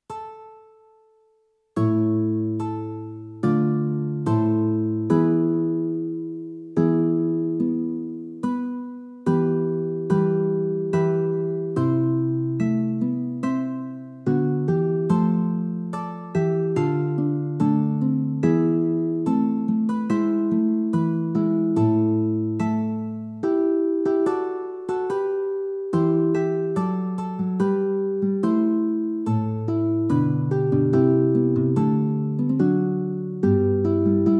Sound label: Verlei uns Frieden tutti; Schuetz